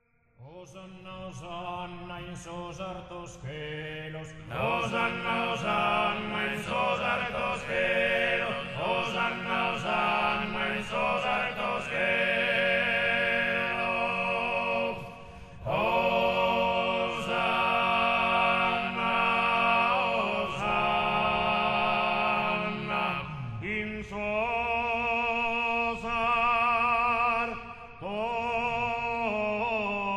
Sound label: Tenores, Santu, Live